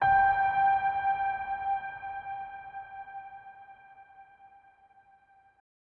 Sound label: Piano Reverb High Monotone